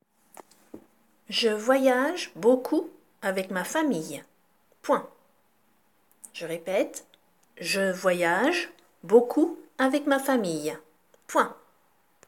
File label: Vocal